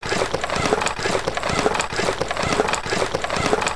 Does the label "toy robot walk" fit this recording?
Yes